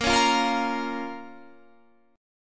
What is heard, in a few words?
A sharp Minor A sharp down Guitar Standard; strummed; JGuitar